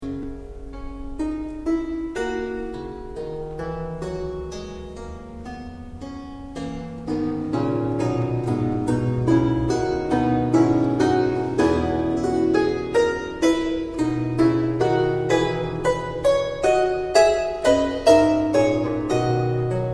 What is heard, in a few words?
tripel, historical harps